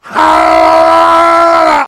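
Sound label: heavy pain critical death; gamstheavy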